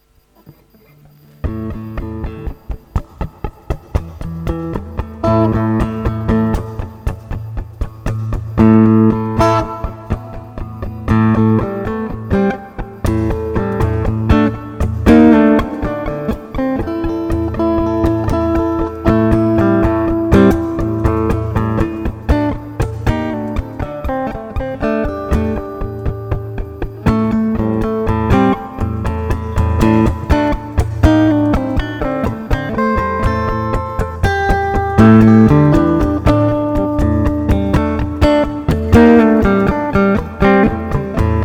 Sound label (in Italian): blues strumentale